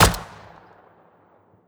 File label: semi pistol attack silenced; Rust